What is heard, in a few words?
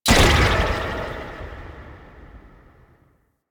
Hair Stomp Burst B; Warframe; Monkey King